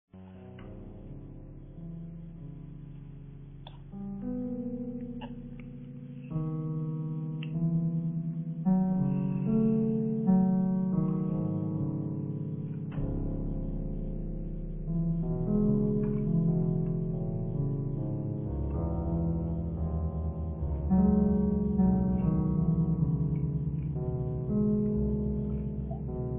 piano; vapesquared

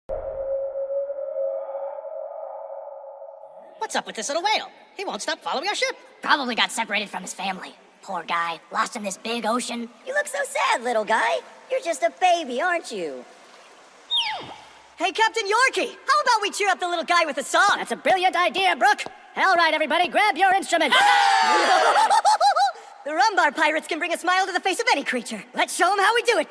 Casio

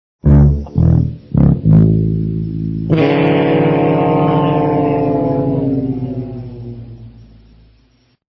horn

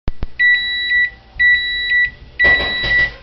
oven timer; cave

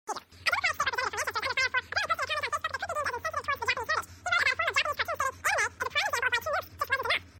nuke

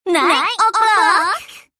clock

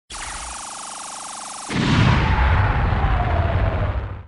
bomb; reversevape

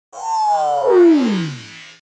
ooofff

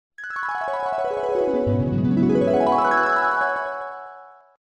flashback; cave

